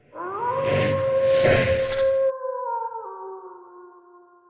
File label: save